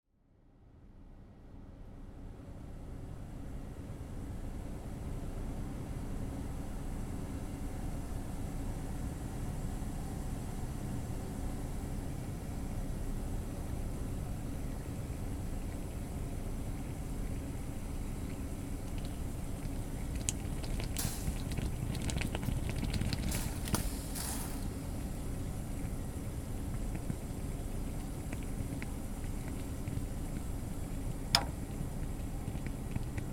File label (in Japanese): エール、紅茶をお供に（沸騰音、茶葉のかきまぜ、注ぐ音）ASMR